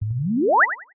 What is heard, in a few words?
synth theremin robot wobble power up short; Jessey Drake